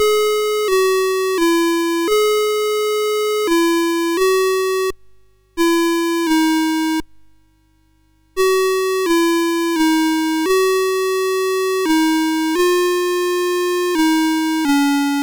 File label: sid MOS6581R4